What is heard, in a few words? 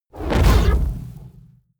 fire whoosh sci-fi small